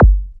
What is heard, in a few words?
Kick